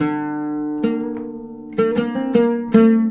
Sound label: LUTH C, Arabian Traditions, LUTH RIFF; USB Soundscan vol.43; AKAI